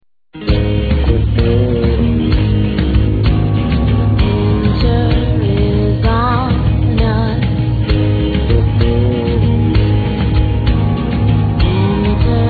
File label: clipped